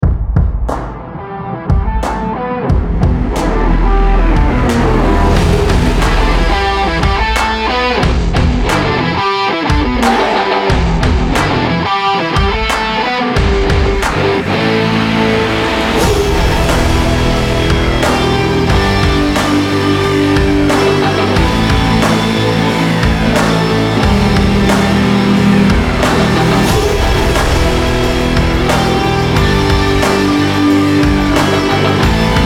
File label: Sport Rock Stomping